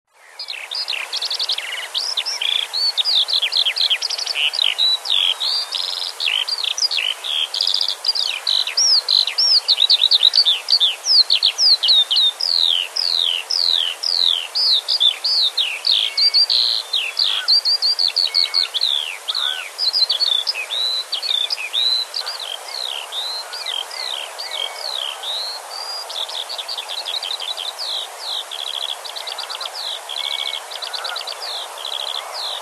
Alauda arvensis

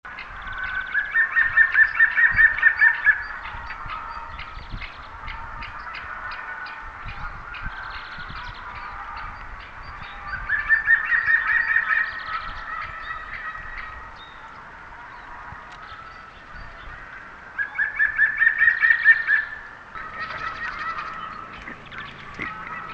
Picus viridis

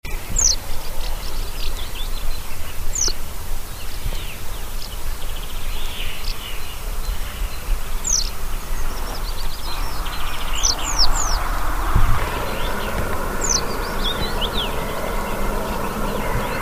Motacilla flava

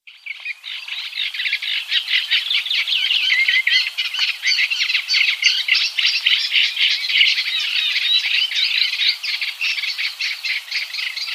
Acrocephalus scirpaceus

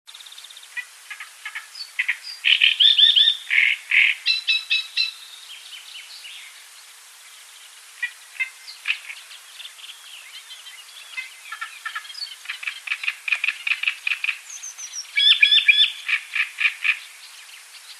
Acrocephalus arundinaceus

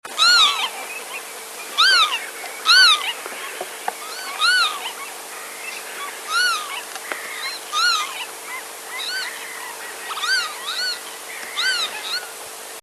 Vanellus vanellus

